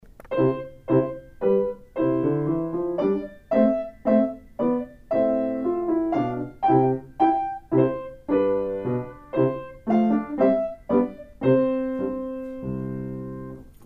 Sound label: Petite Menuet, piano